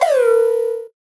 VocoBit Noise, 8-bit; musicradar; 8-bit bonanza

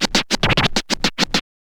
scratch short, Vinyl scratches

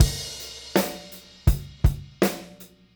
Beat Loops; Zero-G; Monster Beats

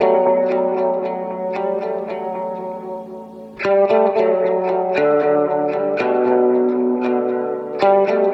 115 E Phrase Oily Guitar; Zero-G; Future Wave

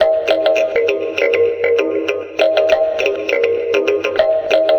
Mbira, Acoustic Beats; Sonic Foundry (Sony Creative Software); Myths of Technology

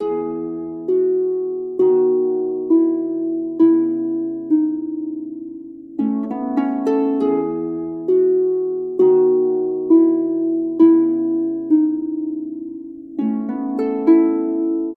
100 E maj, Orchestral Harp; PowerFX; Downtown Orchestra

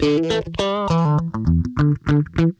THUDDY, Funk Guitar; 90 s Sample CDs; Zero G

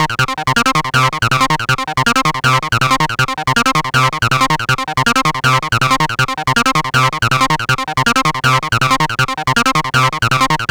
Acid Loops, 160; Dance Synths; Hardcore Loops

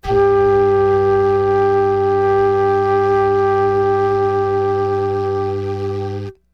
FL HUM G4, FLUTE HUM; Greytsounds - Philip Wolfe David Crozier's Saxophones

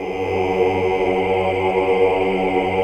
AAH, Hallelujah

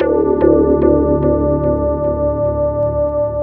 FX EKOPAD; Sonic Foundry (Sony Creative Software); Ugly Remnants VOL-2

